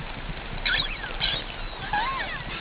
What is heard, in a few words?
pilot, whale songs